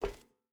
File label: footstep burlap metal walk; Rust